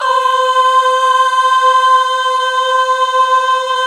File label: GOSP. AAAHH